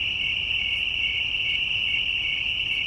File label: Crickets Night; 1944 Battle Of The Bulge